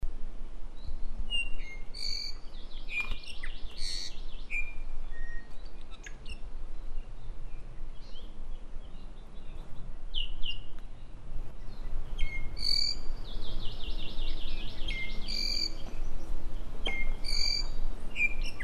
Iiwi Drepanis coccinea

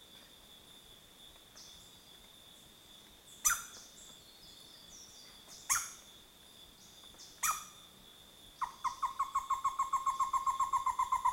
Stresemanns Bristlefront Merulaxis stresemanni

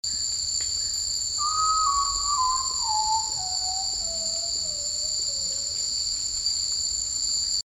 Common Potoo Nyctibius griseus griseus; ssp asumed from location

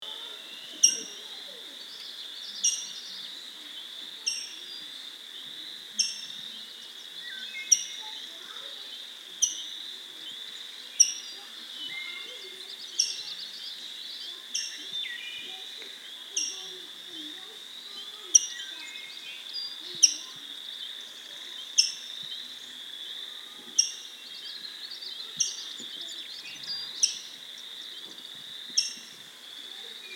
Rose breasted Grosbeak Pheucticus ludovicianus

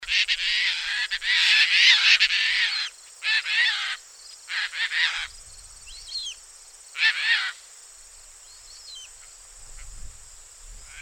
Caspian Tern Hydroprogne caspia

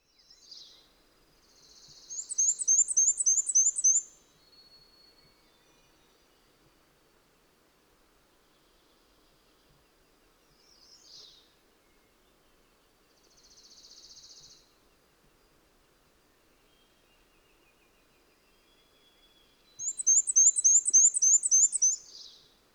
Black-and-white Warbler Mniotilta varia

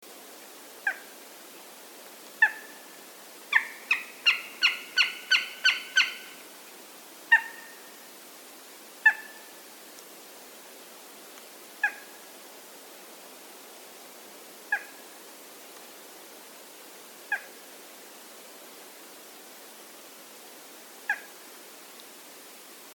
Golden Eagle Aquila chrysaetos

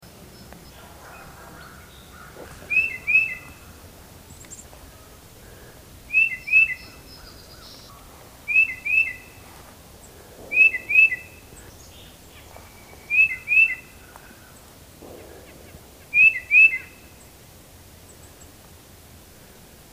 Tufted Titmouse Baeolophus bicolor

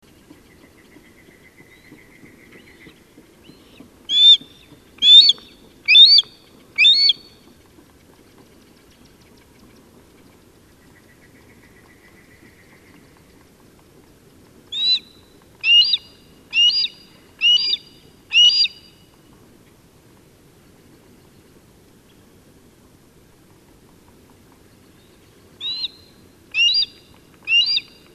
Harriss Hawk Parabuteo unicinctus

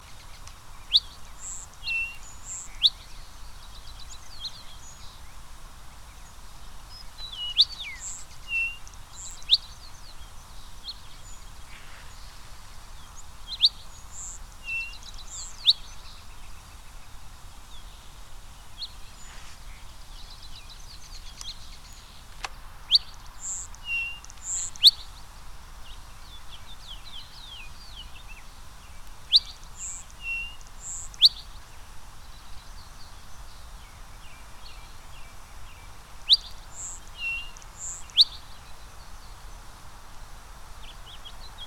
Sierra Madre Sparrow Xenospiza baileyi

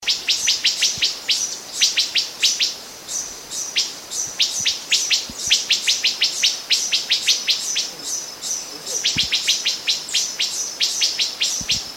Wood Thrush Hylocichla mustelina; Orlando Jarquín G.

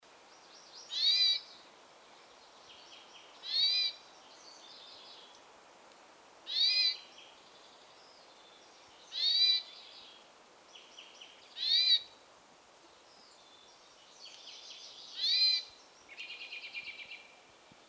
Hermit Thrush Catharus guttatus auduboni

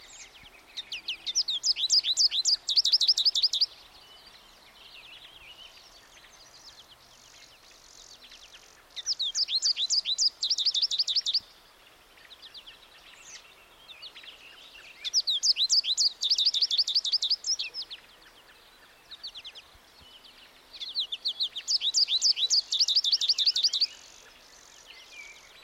American Goldfinch Spinus tristis

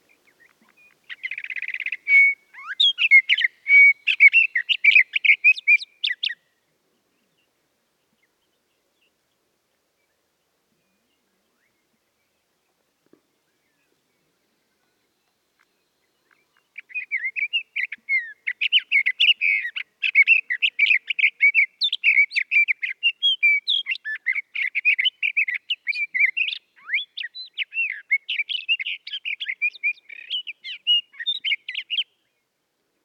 Sage Thrasher Oreoscoptes montanus

